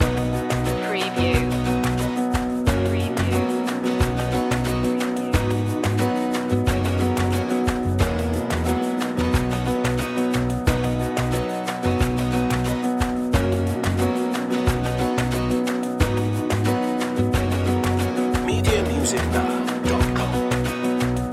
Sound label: happy clappin loop